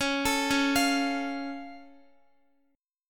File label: C sharp Augmented down Guitar, chord; arpeggiated; tuning=4E,4A,5D,5G,5C,6F